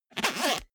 zipper small case open fast

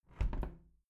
window plastic squeak close soft

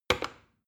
plastic button start click heavy fast

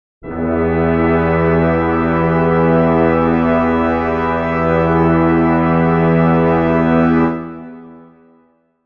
brass chord e minor

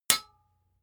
sword metal hit ring short

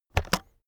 keyboard external space press fast

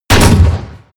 shotgun close shot

